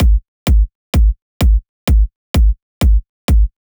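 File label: Drum Loop 128BPM bass drum solo; VEC5; Drumloops; Multi Loops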